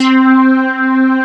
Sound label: CS-70 PAD; East West - Global Sounds